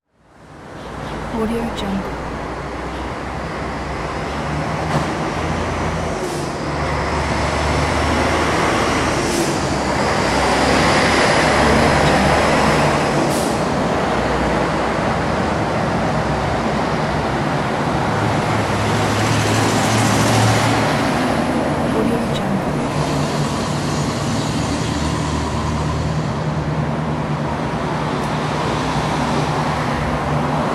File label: city traffic